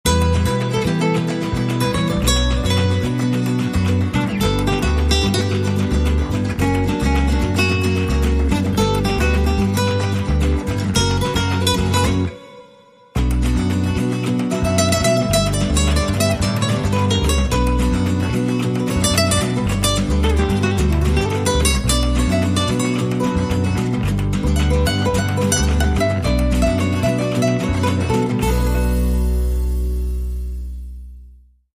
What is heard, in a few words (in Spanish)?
Flamenco Apasionado